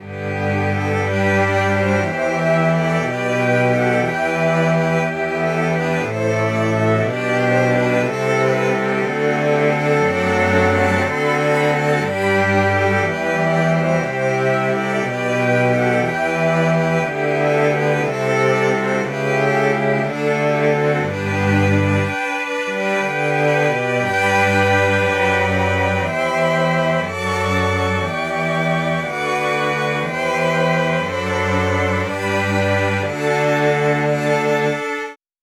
cello

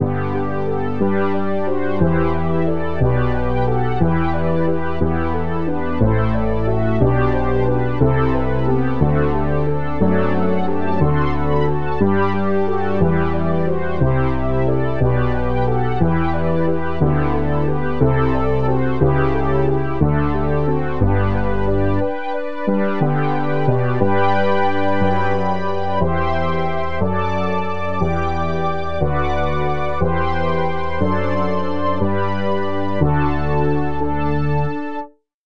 synth brass

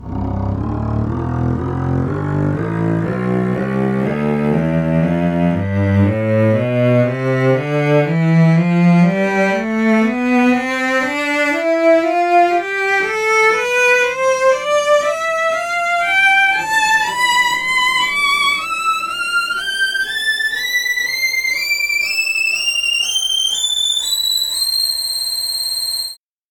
cello tonleiter

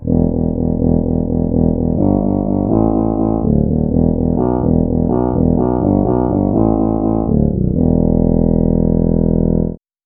soprano sax bass

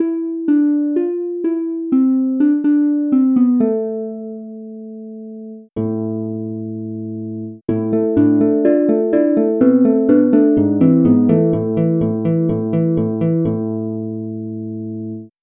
harp

